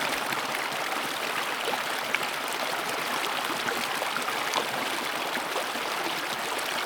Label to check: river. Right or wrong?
Right